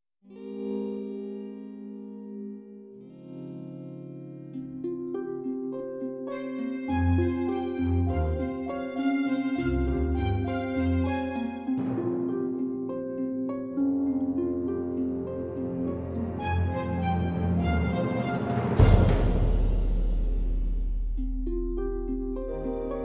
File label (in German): Anfang mit Glockenspiel